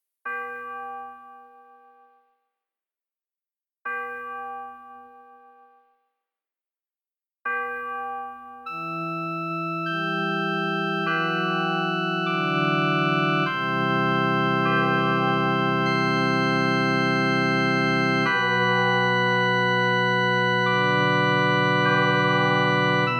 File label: tuning up the organ; rodia